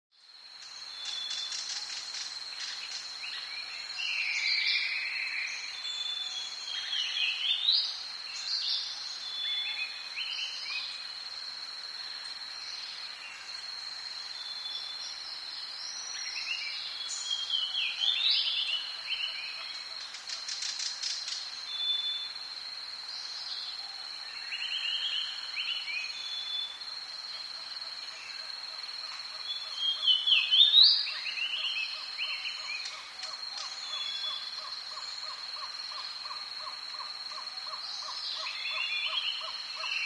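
Jungle Ambience With Medium Perspective Bird Chirps, Distant Exotic Bird Calls, Medium Perspective Cricket Buzz And Close Perspective Insects Flying Past Mic.; backgrounds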